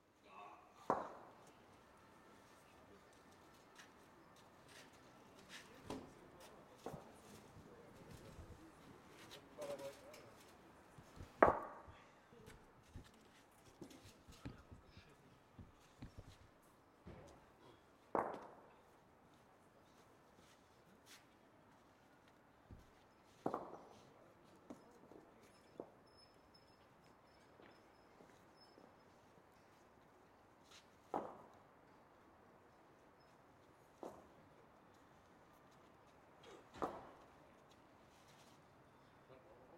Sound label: cobbling stones long